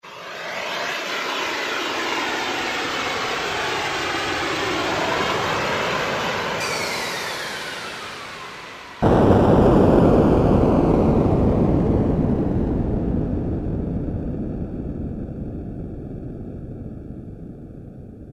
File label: Jet Wars, Roland PN-D50-02